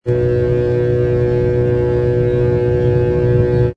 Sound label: transformer